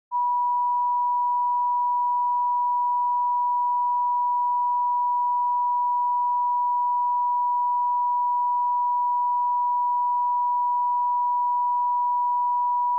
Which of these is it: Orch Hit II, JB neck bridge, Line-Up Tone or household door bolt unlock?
Line-Up Tone